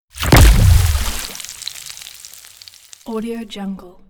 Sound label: future weapons poison grenade launcher hit; future weapons 3; soundmorph